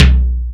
Tom SP; Drums

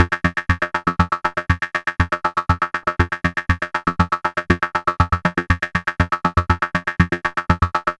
Vocoder Line; Sony; ACID Pro 7.0

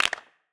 Clap Acoustified; One Shots; Drums; Ableton Live 12 Lite; Core Library

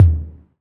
Tom 808 Low DMX; Drums

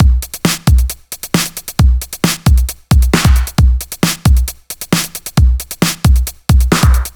Breakbeat 134 bpm, drums; Loops; Ableton Live 12 Lite; Core Library

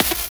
Scrape Snare, FX Hit; Drums; Ableton Live 12 Lite; Core Library

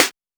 E-Perc Snare, Electronic Percussion; Drums; Ableton Live 12 Lite; Core Library

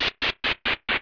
dungeon stairwell bottom descending; Open Zelda; Quest Designer 2.0.4b

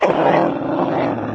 growl, dog; There; ThereClient

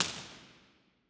Real Places Concrete Hall R; Impulse Responses; Ableton Live 12 Lite; Hybrid

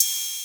Ride Machine; Drums

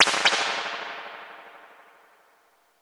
Springs Clean Spring Slapback; 4s; Impulse Responses; Ableton Live 12 Lite; Hybrid

